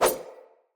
Dagger Whoosh; Weapons; Swords; Josalfar

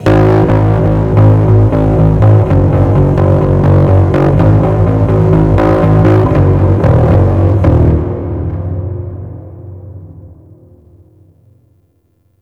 Stage Bass Guitar; Warframe; Ambience; Nineteen Ninety Nine; Obj Temple Defense

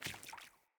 Sandals Walk Water; Player; Footsteps

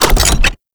Hack Minigame Mech Action; Warframe; Duviri

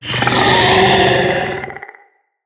Infested Ancient Idle; Enemies

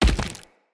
Mockery Foley Land; Enemy; Mockeries; Characters

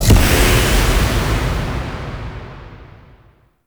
Void Dragon Transference In Explosion; Enemies; Gameplay; Warframe; Duviri

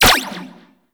Shot, Bladed Pistol; Akjagara Prime

